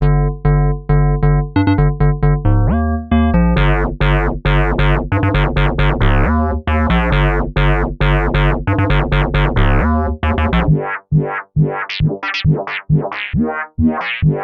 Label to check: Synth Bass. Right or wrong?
Right